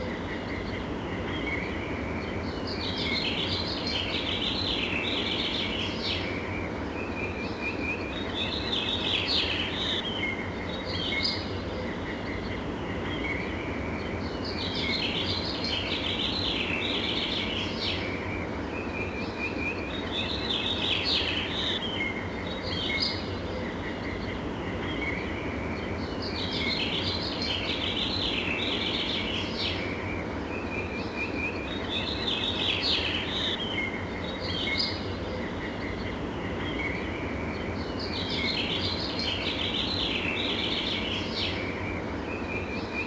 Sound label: ambience; Street Hoops; XBOX